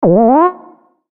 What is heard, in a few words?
magical; maoudamashii